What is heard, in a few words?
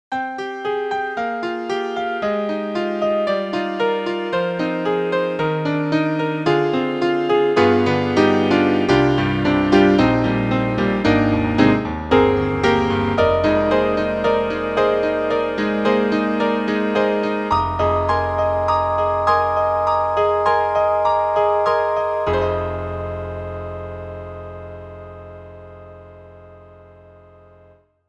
Acoustic Grand Piano; Apple; Quicktime Built-in